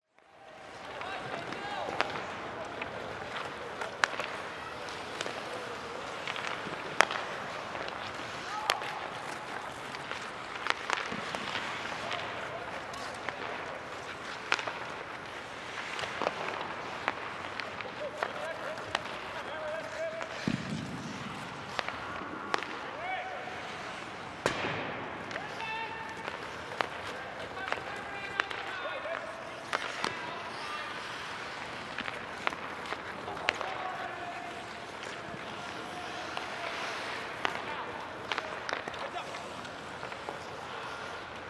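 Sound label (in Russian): khokkei trenirovka zvuki sredy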